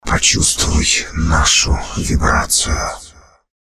Джингл

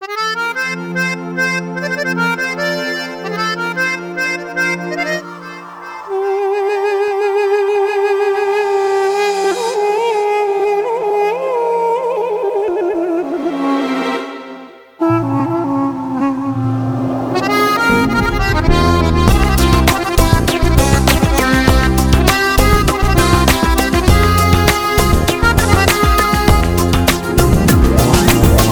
Музыка для Рекламы; Always Turkmen

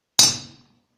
Удар барабанной палочкой

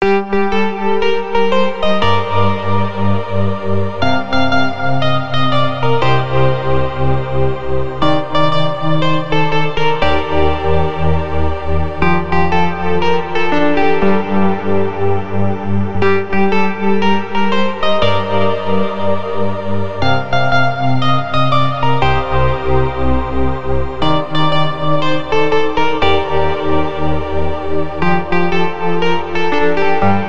мелодия для вас друзья

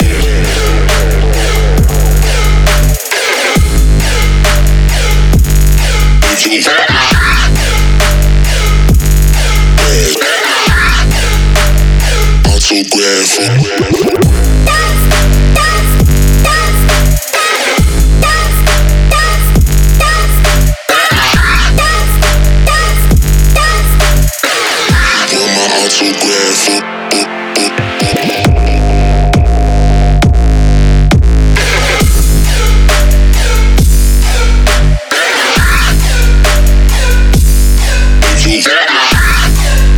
Отбивка Trap

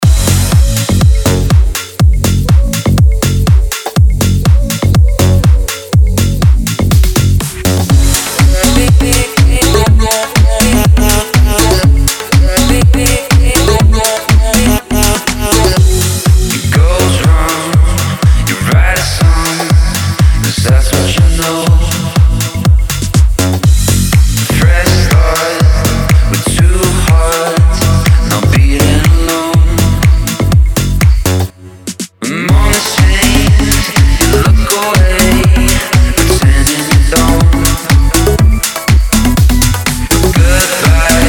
Военный оркестр

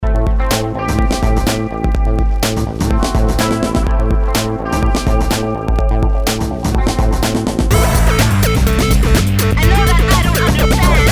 Бигбит

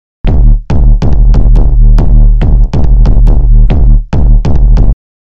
loop бит из стиля техно

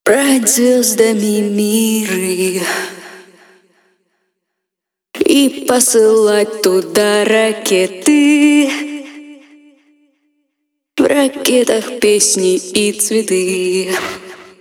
Акапелла, 166 BPM, F#m

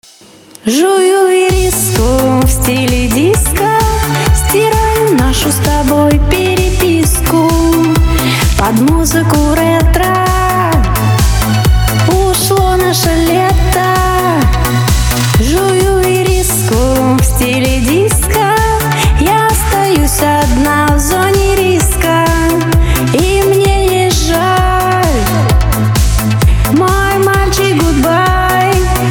Рингтон

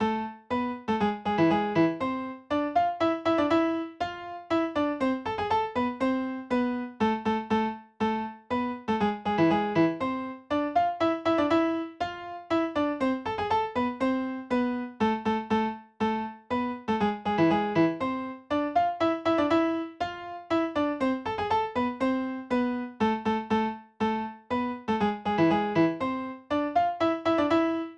Мелодия

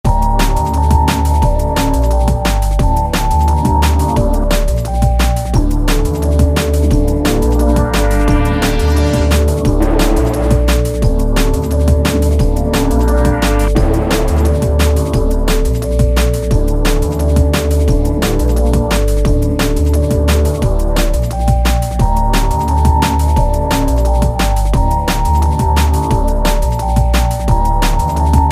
Минус Drum & Bass

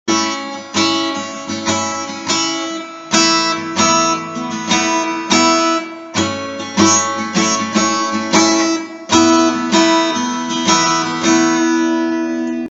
Гитара, сэмпл 150 Bpm

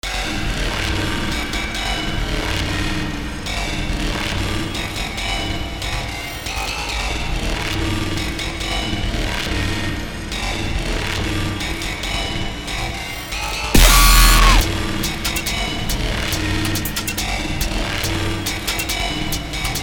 Очень красивая музыка для души

